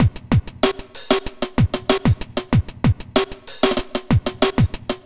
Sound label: kit; Sound Effect Library